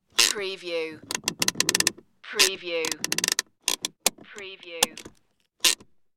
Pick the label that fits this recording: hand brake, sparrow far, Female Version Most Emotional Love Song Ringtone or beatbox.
hand brake